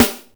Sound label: Snares & Claps; Vengeance Samples